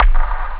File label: drums bass; OctaMED SoundStudio V1